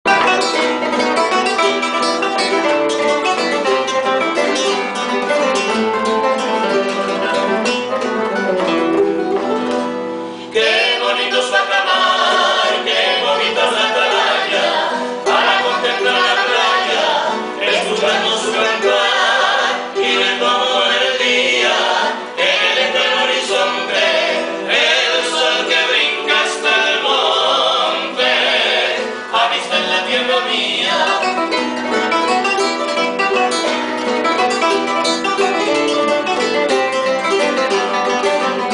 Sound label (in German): kanarische musik